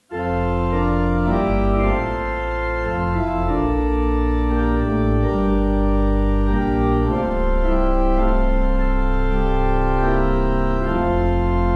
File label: Vocal Organ; Trentham